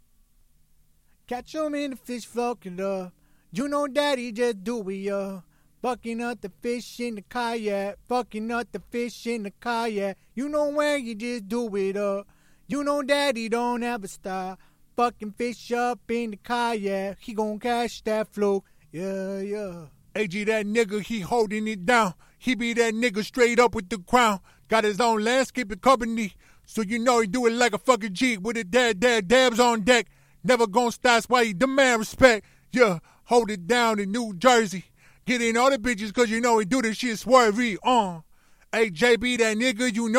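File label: hip hop 105 BM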